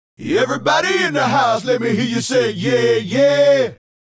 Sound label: Male Group, Hip-Hop and RnB Vocals